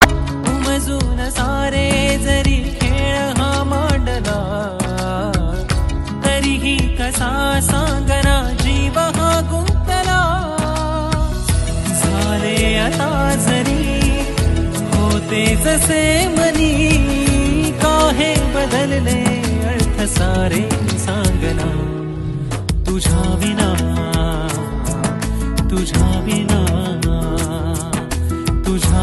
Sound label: Male